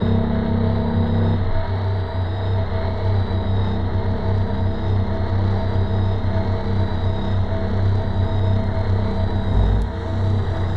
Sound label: Music Box